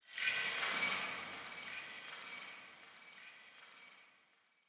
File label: clicking echoes, ambient creatures; Half Life Alyx